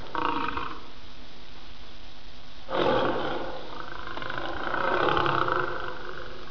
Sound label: lion; savanni